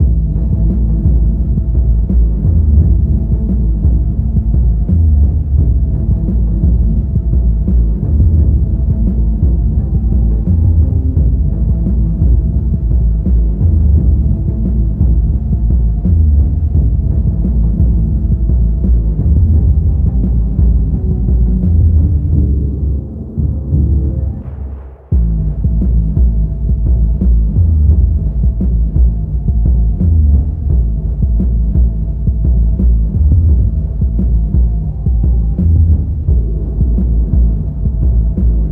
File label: Radio Outside; Crackhouse Radio 3